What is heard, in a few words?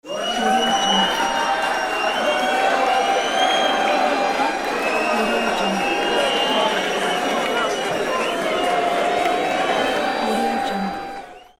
cheers